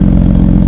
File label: idle, engines; RS7